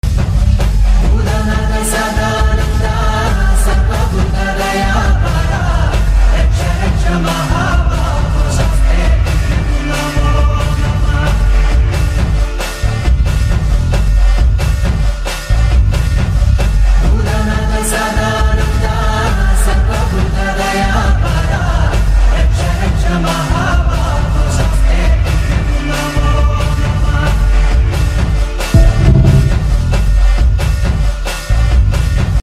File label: bgm ringtone music love song malayalam; swami ayyappa